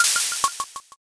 syringe

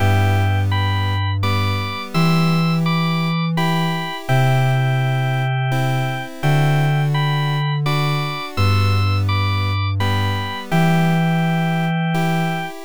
bgm

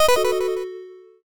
eliminate

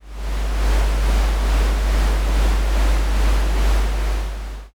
conveyor

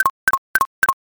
fs geta

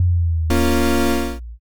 boss appear

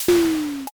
train door

